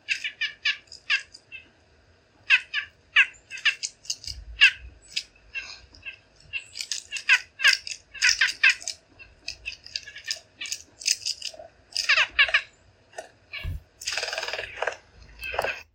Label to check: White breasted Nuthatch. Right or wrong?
Right